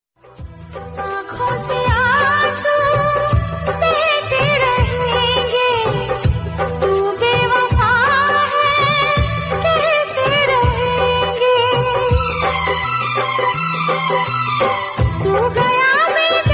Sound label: Sad Female Song Ringtone; ringtones